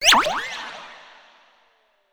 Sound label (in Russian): magiya ischezaet v mgnovenie oka